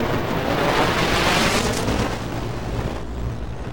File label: FX Loop, Drums & Drones